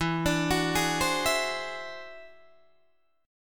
E Augmented 9th E down Guitar Open D

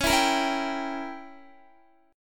Db Diminished 7th Db down Guitar; strummed

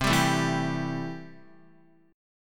C 6th C down Guitar; strummed

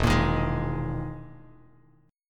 E-Major Sharp 11th E, Bass Standard; EM#11 strummed; 0,13,13,13; JGuitar

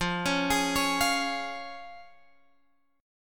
F Minor Sharp 5th, Guitar Drop D, chord

